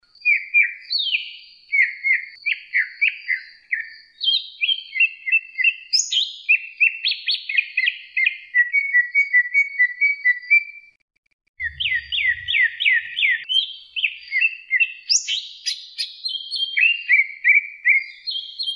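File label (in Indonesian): suara cucak hijau medan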